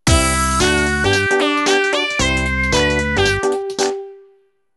Game Over; super monkey ball ticket blitz; ringwide

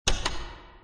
button; Five Nights at Ronald's

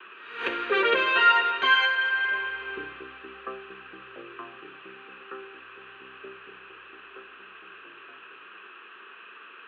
TV news intro; teardown; ps5 windows xbox series xs

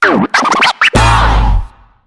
Racetrack Lap Winning; Trailblazers

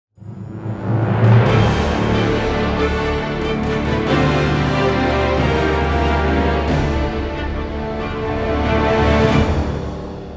Crushing Defeat; Wargroove 2

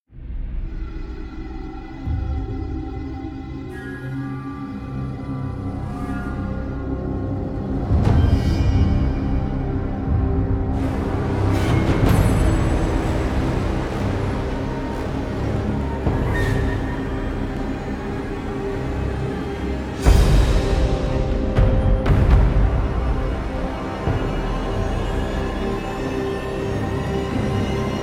Dynamic Field Dark Mood Medium; bless

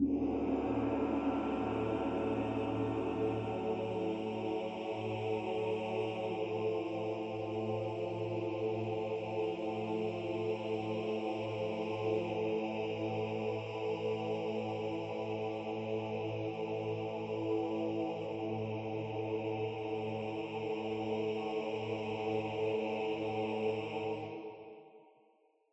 Choir; Workshop Rnd; tintin reporter cigars of the pharaoh

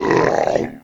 Zombie Hit; The Battle for Wesnoth

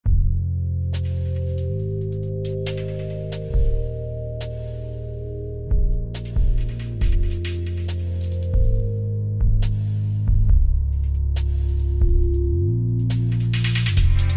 ambient; ChromaGun; cut version stephan ambient

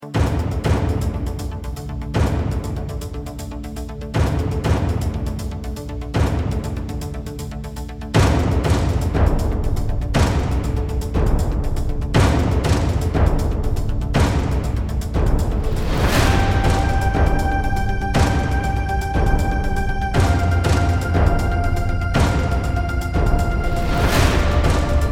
Electro Cinematic; Sairento VR; PS4 Windows gamerip 2018

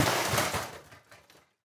metal break

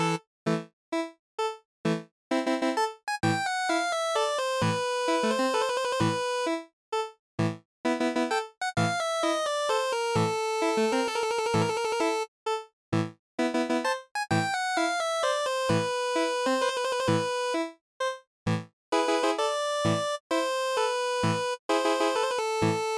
battle bgm; Takeshi and Hiroshi

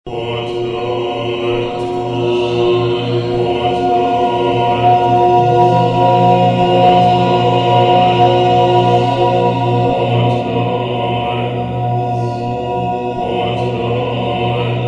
Ambience Button Heaven; The Stanley Parable Ultra Deluxe

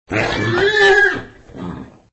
horse attack

